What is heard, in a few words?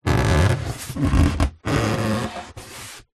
foley polystyrene hand rubs creaks; zapsplat